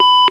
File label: PC Beep